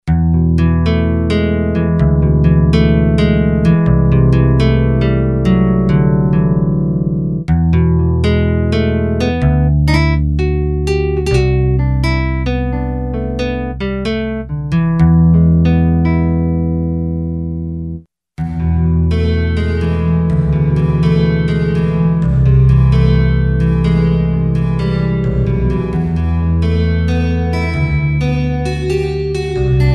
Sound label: REV 2 ROOM, Yamaha REX50